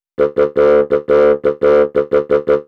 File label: Bass Clarinet, Cinematic Funk; Sonic Foundry (Sony Creative Software); Cinematic Funk Urban Tone Poems